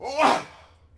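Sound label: Warrior Attack Vox; Civ4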